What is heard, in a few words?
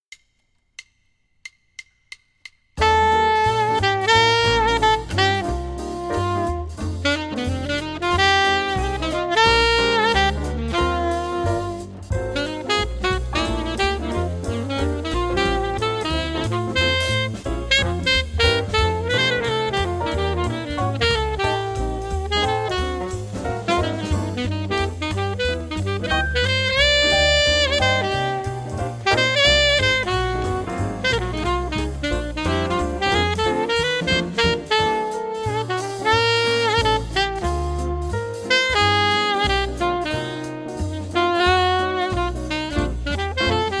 alto sax swing